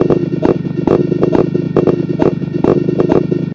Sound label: brokenup bass; BASS LOOPS